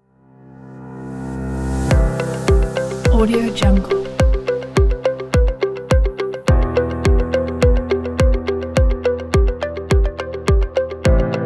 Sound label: corporate ambient light kit